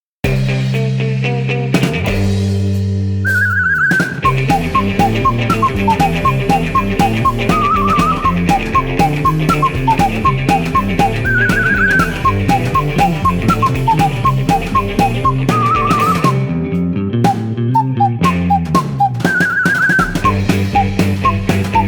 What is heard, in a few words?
Blues; Figment 2 Creed Valley